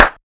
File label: Victory Clap; smite; kumbhakarna